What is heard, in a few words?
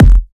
TRAP KICK